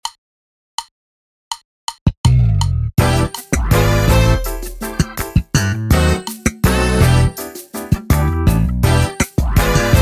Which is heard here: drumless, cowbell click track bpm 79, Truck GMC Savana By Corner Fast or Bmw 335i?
drumless